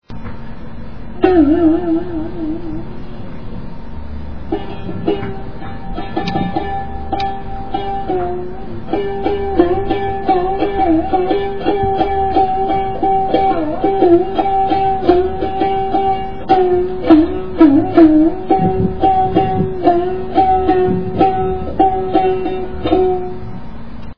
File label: ektara, Africa musica; Etnologia